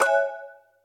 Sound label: water Hover; SFXBattle